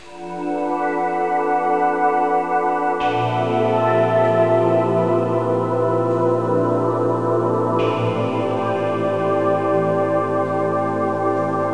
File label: Pad; Great Eagle 2